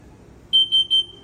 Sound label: timer